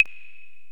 Korg x5, Drums

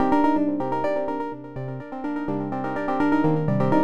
synth 125 panbeaut C Min; Transmission-X

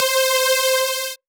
Synth, DnB One Shots

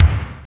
KICK, Tekno Industrial; East West

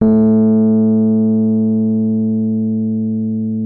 MK1 A2, RHODES; Xsample VOL-1

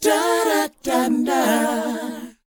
DOWOP F, Vocal R&B DOO WOP; ILIO; Vocal Planet

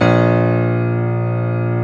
GRAND A#0; Analogon

